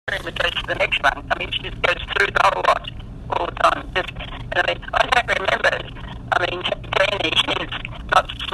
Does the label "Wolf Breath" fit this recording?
No